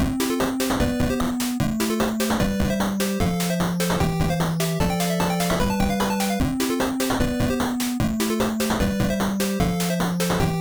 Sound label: Menu; Smbe Menu Aka. Sonic 4 Menu Nes; Super Mario Bros. Expanded